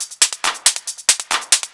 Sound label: drum-loops, bass-department, techno, 138; Techno ID